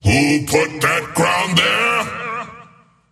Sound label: swipe; skeleton king